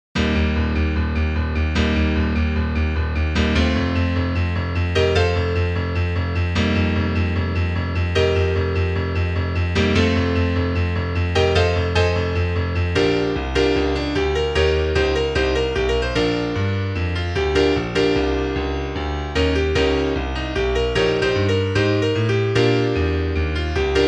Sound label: Piano; Abraham et semini eius; magnificat; training sounds